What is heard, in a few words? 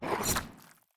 Growl and Attack; Blue Melmee